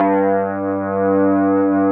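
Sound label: Wurlitzer G2, Keyboards Old School; USB Soundscan vol.09; AKAI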